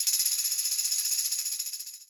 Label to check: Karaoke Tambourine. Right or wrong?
Right